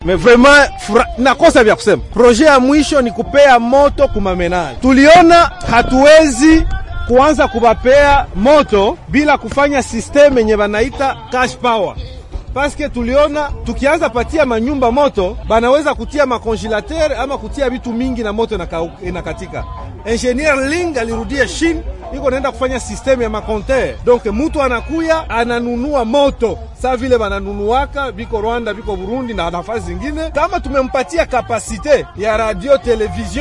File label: SWAHILI